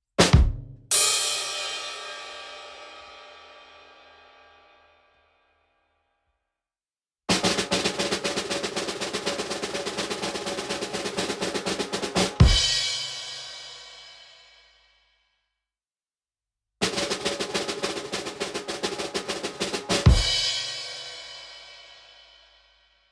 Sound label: drum roll